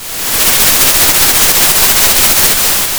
pop, Sound of a thunderstorm

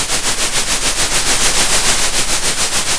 pop, Sound of a train

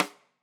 Rim

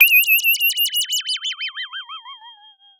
Sound of a bird tweeting nicely

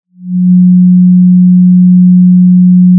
Sound of a cruise ship horn

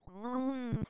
pleasure moderate; VIVAE; vocaltrax

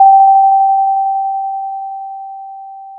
Sound of a doorbell

